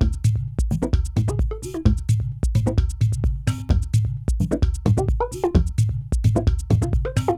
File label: Dance Drumloop; Drums; Dance Beats